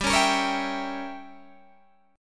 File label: G Diminished 7th, Guitar, Open G